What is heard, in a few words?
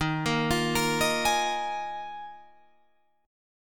D sharp Suspended 2nd Suspended 4th D sharp Guitar Drop D